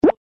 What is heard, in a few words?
move